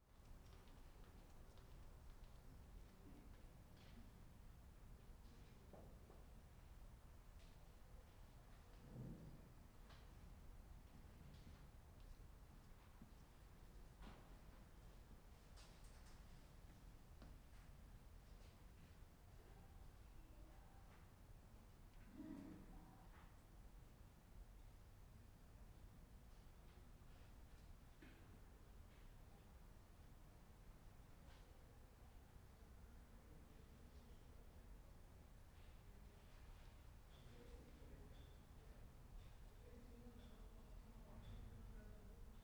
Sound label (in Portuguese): Ambiencia Biblioteca Universidade de Brasilia Vazia; colecao sonora do cerrado